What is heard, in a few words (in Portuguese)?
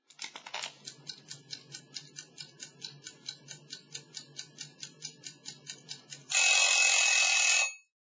Vinheta Relogio e despertando